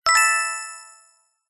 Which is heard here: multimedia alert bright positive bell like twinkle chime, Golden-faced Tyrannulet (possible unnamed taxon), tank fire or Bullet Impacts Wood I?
multimedia alert bright positive bell like twinkle chime